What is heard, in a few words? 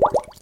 toast